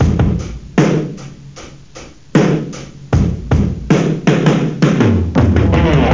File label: bass drum loop; OctaMED SoundStudio V1